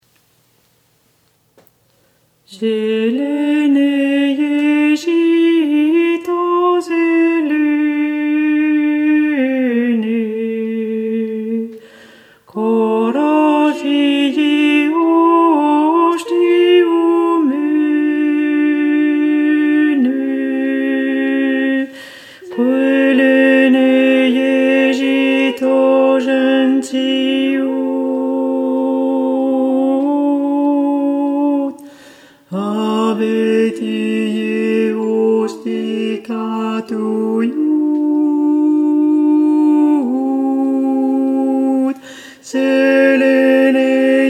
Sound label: tenors basses; zelene zhito